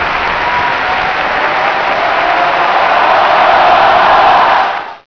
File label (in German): Applaus